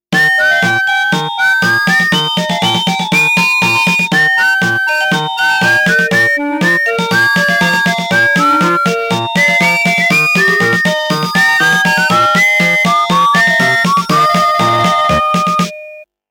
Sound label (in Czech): melodie